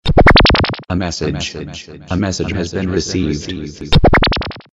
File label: Msg Tone